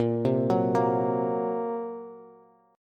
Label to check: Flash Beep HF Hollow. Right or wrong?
Wrong